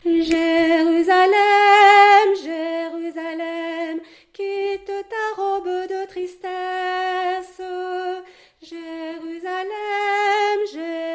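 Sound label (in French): Mariage Debout A, chœur; alto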